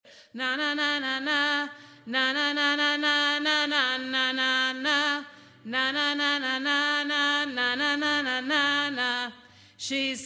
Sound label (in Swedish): the look alt, stammor